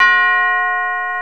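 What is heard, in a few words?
church bell; Greytsounds VOL-1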